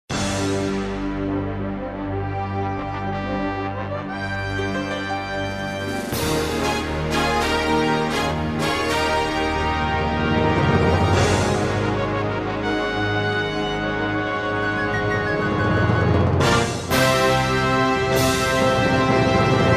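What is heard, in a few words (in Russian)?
торжественные на награждение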